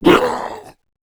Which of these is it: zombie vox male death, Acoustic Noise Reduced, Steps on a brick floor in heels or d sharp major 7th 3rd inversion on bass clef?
zombie vox male death